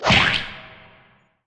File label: hit; Be Cool Scooby-Doo! - It's Dark Out There; ThrillGames